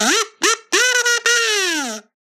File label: kazoo melody phrase; soundscrate